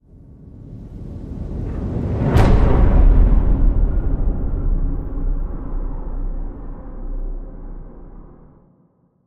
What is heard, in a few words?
Hit Low; Hit Low Plunder